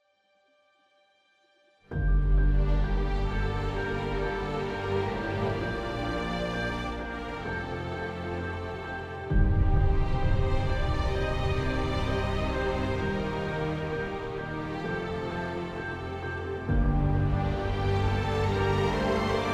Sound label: String theme w atmo boom and ostinato